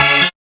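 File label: TECHNO CHORD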